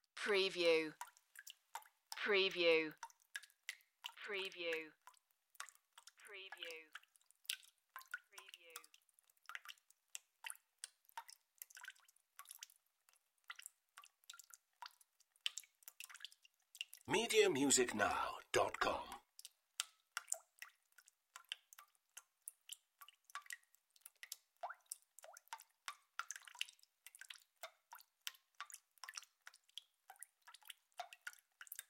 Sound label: Water Drips Bucket; Sound Effects Bible